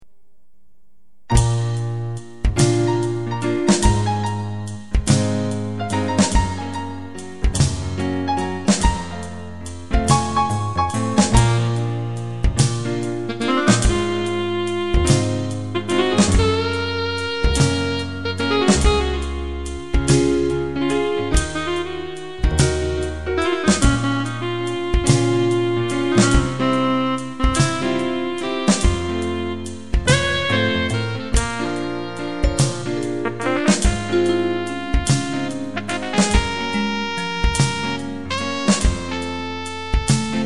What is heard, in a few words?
jazz